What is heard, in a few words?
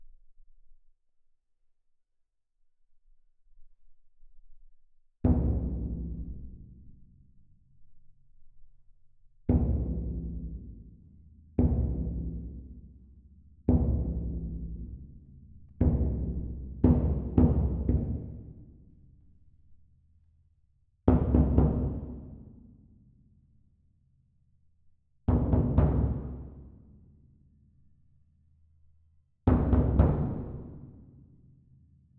Perc